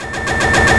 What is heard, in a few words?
Backwards Noise OS; Sonic Foundry; Rondo Brothers Trip-Hop Loops & Samples; In The Valley